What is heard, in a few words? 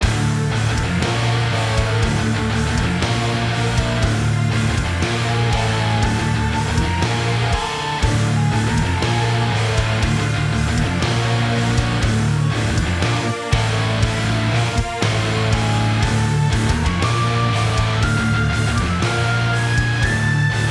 Orchestral Rock Colosseum; Orchestral Rock Vol 2